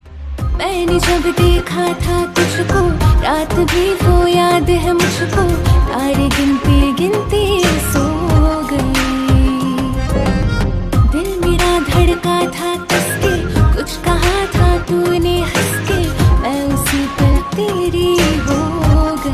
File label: Female Version Ringtone